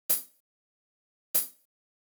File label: chh outer; hydrogen